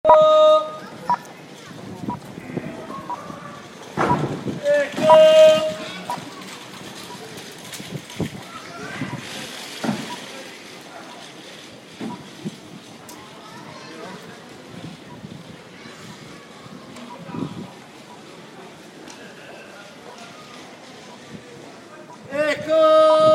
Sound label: echo; cork